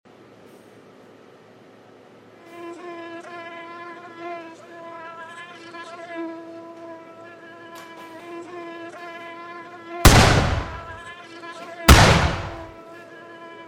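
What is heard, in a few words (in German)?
Mord an Mücke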